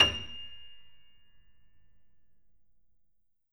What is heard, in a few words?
Grand Piano F6 f